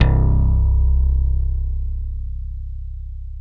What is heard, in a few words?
ELECTRO, BASS; SoundFactory